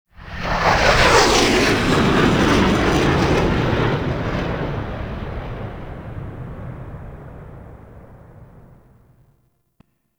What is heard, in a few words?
Drop Pods Fly By; Eidolon